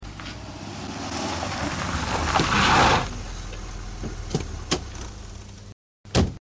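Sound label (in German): Auto kommt bremst auf Schotter eine Türen fällt zu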